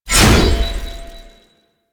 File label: Sword Swipe; Warframe; Weapons; Melee; Whooshes; Ballas Sword